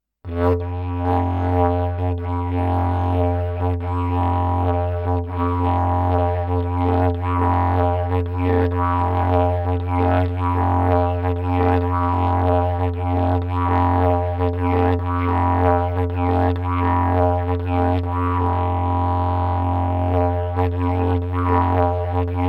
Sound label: solo, didjeridu; kenbi; how to play didjeridu